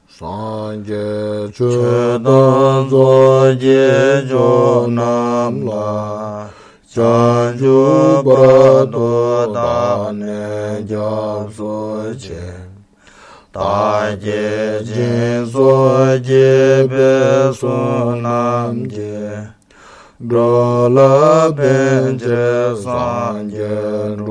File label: Short Refuge Prayer melody